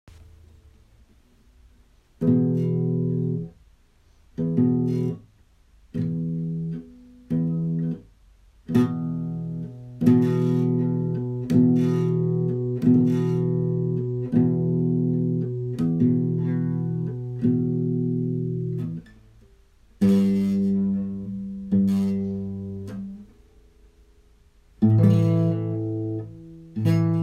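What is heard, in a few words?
Power Chords Practice, guitar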